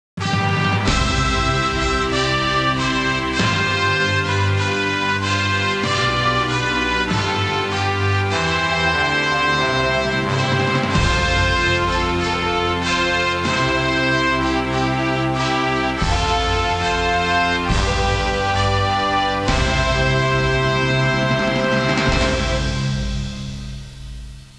musica sinfonica; symphonic

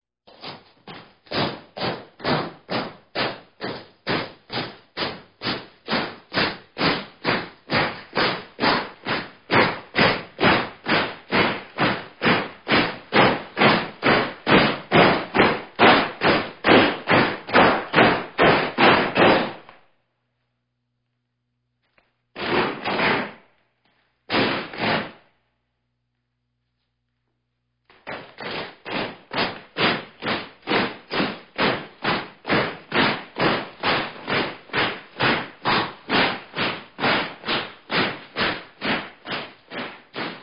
armi

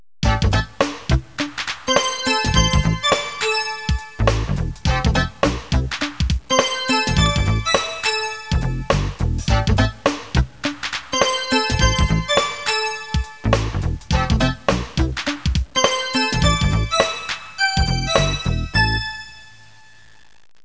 suonerie businessy

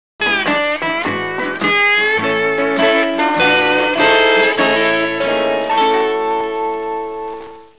musica strumentale

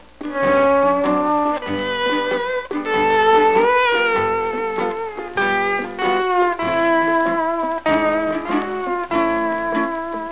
suonerie musica hawaiian

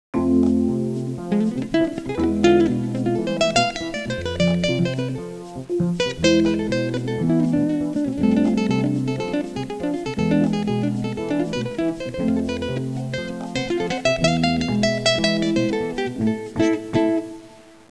suonerie musica jazz

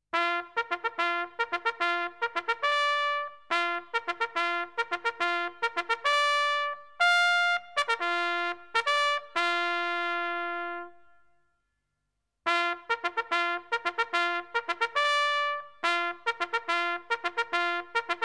suonerie tromba militare

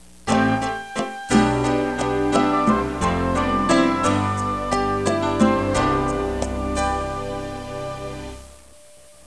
musica newage

